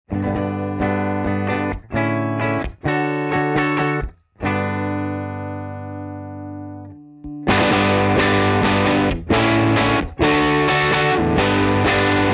Dark and brooding in this example, Fairfax toes the line between fuzz and overdrive; Sad And / In Ohio